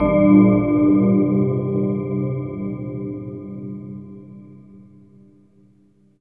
Synth Bells; Dream Zone

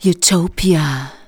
vocals sung; Techno Trance Essentials

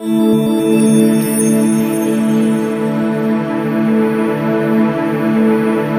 PAD, 140 BPM; Ueberschall; Drum & Bass Flash Trackz

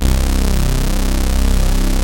BIG STAGE, synths; Pro-Rec - Synthsonic

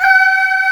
VOICES C5, Analog Fantasies; USB Soundscan vol.49; AKAI; YAMAHA

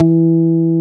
E3, BassGuitar STACK BASS; AKAI S6000 CD-ROM - Volume 1

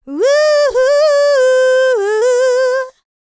LICKS, Vocal Planet, R&B; ILIO; Dance; LT R+B

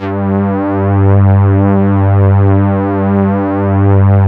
SYN BOW STR, ENSMBL; AKAI S-Series CD-ROM Sound Library VOL-8

